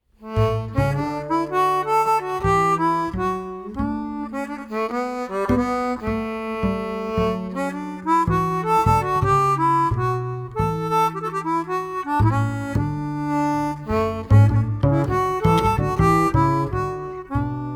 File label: Quartet, Polska; Polska efter Pers Olle